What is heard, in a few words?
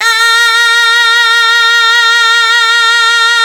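SHENAI, Shenai (Vib)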